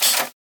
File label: typewriter